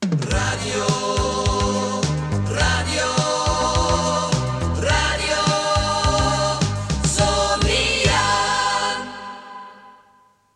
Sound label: Drum; Radio Zoniën; jingles